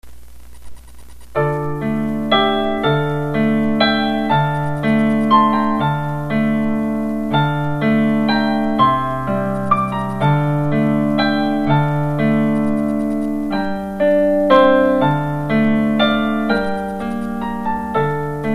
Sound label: Piano